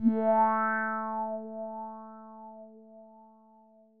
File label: Wahwah